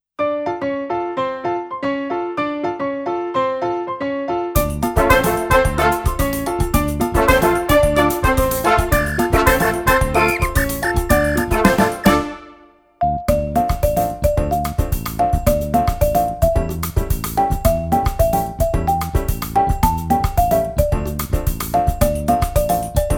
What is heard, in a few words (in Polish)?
Samba inst